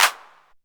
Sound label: CLAP, one shot drums; App Inventor Media